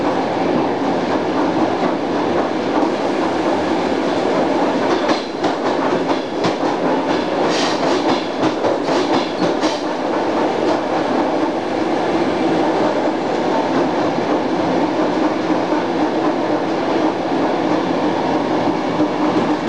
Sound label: drive, vehicle